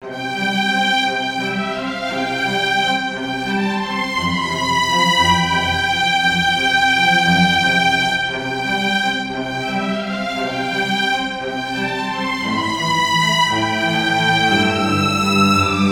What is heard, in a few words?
Reflective